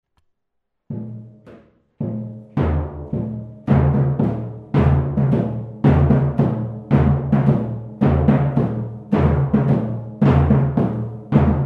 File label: surdo; vient du latin «qui rend sourd»